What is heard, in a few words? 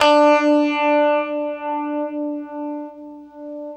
UNIVIB D, Guitar; East West; Steve Stevens Guitar Samples Collection